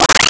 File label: Arcade Power Up; Arcade and Games